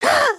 gasp

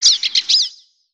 3D Birds Swallow; ambience; Ninjago

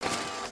Fidget Arm; emotes; Overbuild

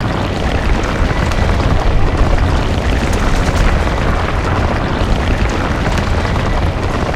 Earth Rail Loop

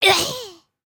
Die; Lego Strombie; creatures organic

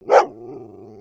doberman bark

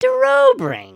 just kidding, emotes

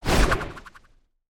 Spinjitsu Ice Shuriken Project

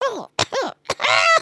collapse

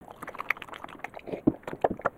Pet Eating; creatures pets

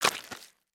Foot Mud Walk Light, footsteps

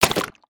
Stink Bomb Fish Flop

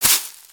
Sunflower Seed Toss; weapons; aronwk collection; ndaudio

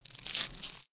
Lego Droppings; destructibles

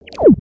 Bubble

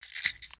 Upset Ratchet; emotes

